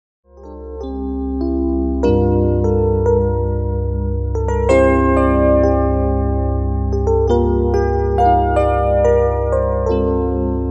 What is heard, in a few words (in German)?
klangschalen massage